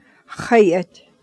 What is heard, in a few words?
beat